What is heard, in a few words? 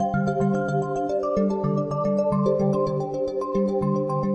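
mellow bell arpeggio loop, 110 bpm; SYNTH AND ELECTRONIC LOOPS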